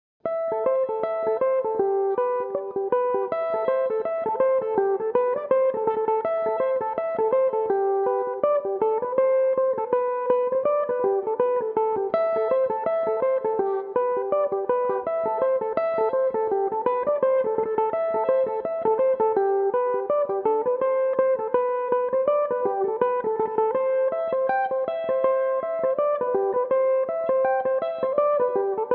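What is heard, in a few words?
A dorian, Irish mandolin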